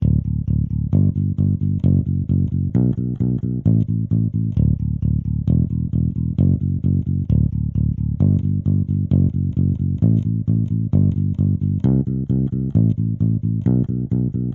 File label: Elec bass